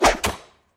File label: arrow swoosh and impact